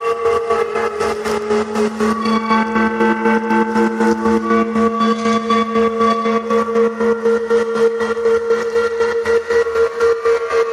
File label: Shiny Metal Pulse